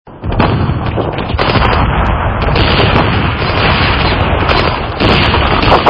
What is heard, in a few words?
Gunfire